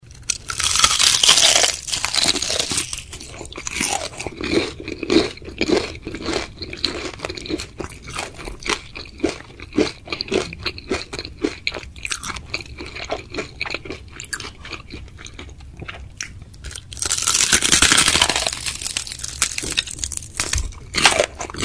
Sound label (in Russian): zvuk kusaniya i jevanie zajarenoi edy; Шум, издаваемый людьми во время кушанья еды